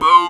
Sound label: boom; PlatinumPhattCD1; E-MU Producer Series Vol. 8 – Platinum Phatt; Best of Phatt